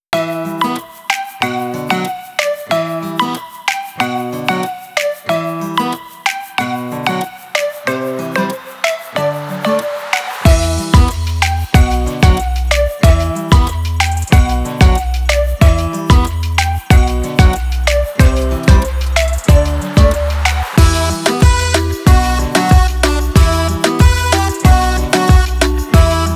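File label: ispanyol latin reggaeton; By Burak Sound Bank; latin ispanyol tema vol 3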